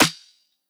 snare oneshot, Pop Sample Pack; Slate; SD PASADENA Pop Sample Pack

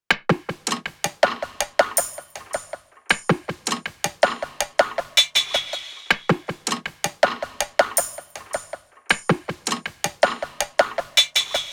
perc loop 80, Pop, Drums; Loops; Slate; SD PASADENA Pop Sample Pack

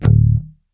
Bass Pick Short Low D, Electric Bass One Shots; Slate Digital BASSHOLE Sample Pack

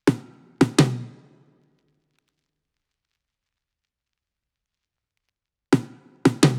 perc loop 85, Pop, Drums; Loops; Slate; SD PASADENA Pop Sample Pack

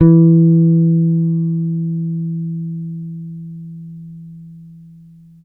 Bass Long Dark E, Electric Bass One Shots; Slate Digital BASSHOLE Sample Pack

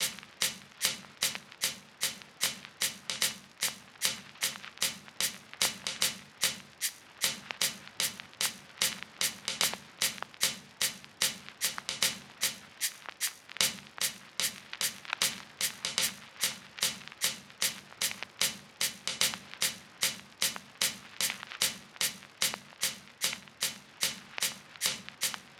hats 75, Hat Loops; drum sample pack; Daisetsu; SEISMIC; Slate

